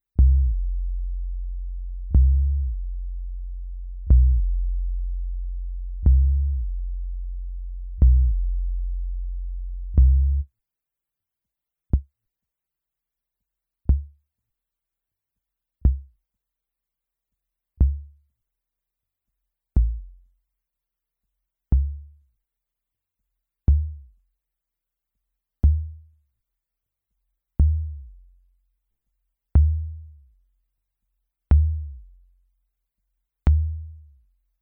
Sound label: MFB Tanzbaer Bass Drum